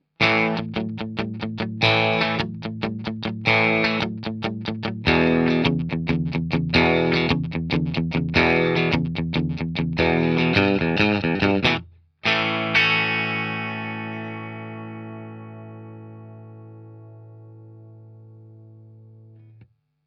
vox std, tele

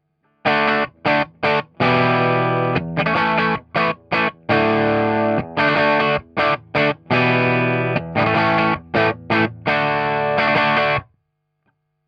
orange keller, tele

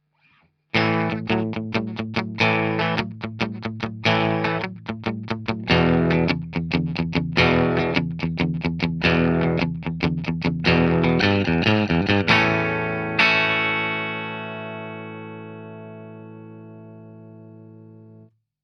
vox keller, tele

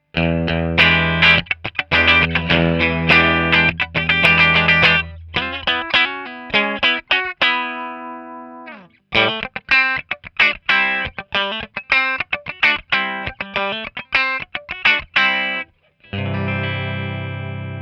clean, tele

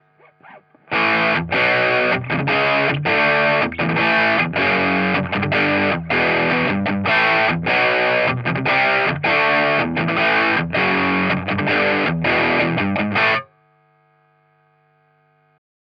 orange gain, tele

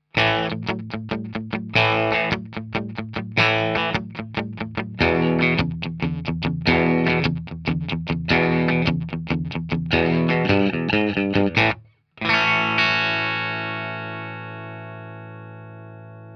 vox, tele

